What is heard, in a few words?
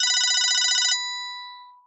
phone ringtone; devices accessories utilities; NS Studios - ultra sound collection